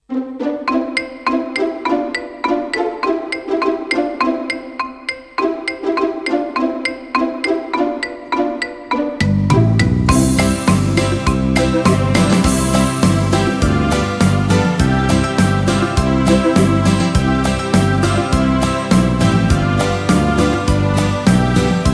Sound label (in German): Muss i denn zum Städtele hinaus To play along with, recorder